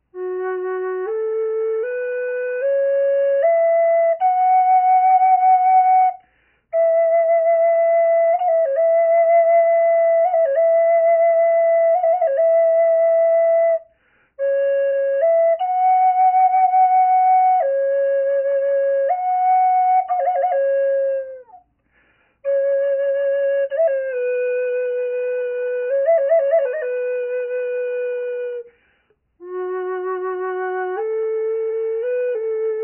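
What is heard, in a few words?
natural, flute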